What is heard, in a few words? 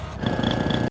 repaired; Peripheral Empires